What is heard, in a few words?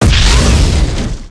fire in attack